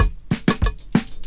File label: cowbell, funky drum loops